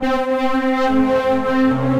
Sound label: Horror Horns, Bold Brass; Instruments; Ableton; Factory Packs; Mood Reel